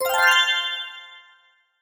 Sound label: powerup; Bananaman - Chase in Space